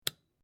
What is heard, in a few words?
Flip Switch Up, A basic light switch click sound; Light Sound Effects; Lights (Sound Effects:Household)